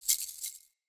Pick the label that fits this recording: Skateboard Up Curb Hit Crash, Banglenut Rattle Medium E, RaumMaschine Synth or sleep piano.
Banglenut Rattle Medium E